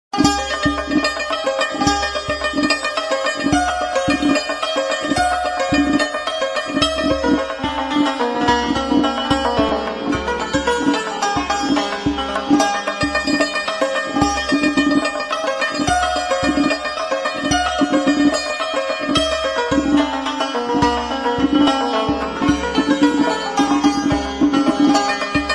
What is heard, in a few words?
Instrumental Santour